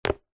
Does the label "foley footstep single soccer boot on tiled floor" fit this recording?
Yes